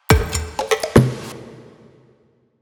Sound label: Drum Fill 123bpm